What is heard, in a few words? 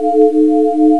FOURIER